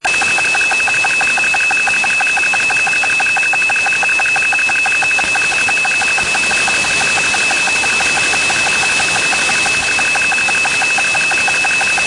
docking with ISS; TORU 121MHz746; Progress M-02M

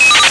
single burst

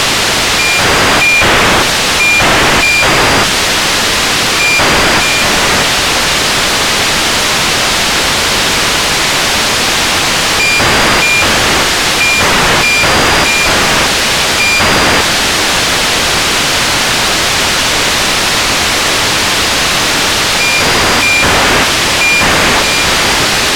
sats talking to each other 435mhz645

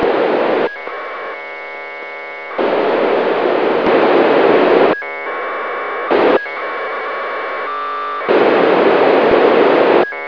ande2 packets

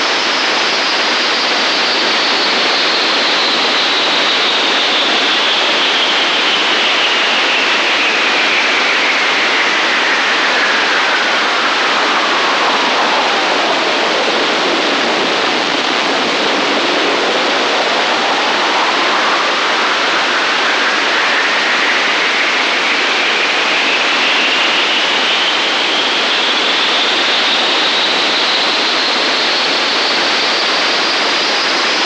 2251MHz08; tiangong

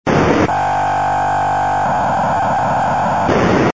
2m psk; bo-47; idefix

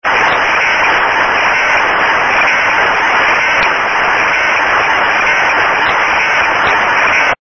RS-25 Daten 435mhz325; hermenau

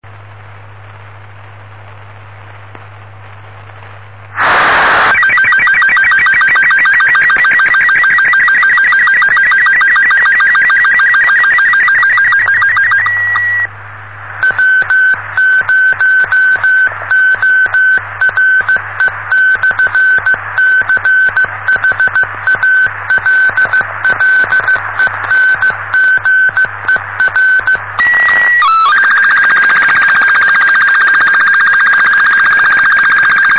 sstv; STS51f challenger 5Aug85

